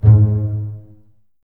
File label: BSS G#2 Pizz, String Ensembles Basses; Miroslav Vitous